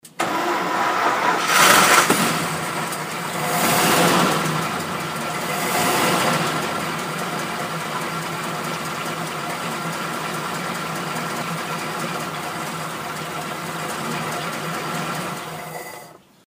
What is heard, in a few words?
Mercedes Benz 190SL